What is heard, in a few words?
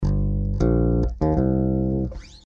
Slow Groove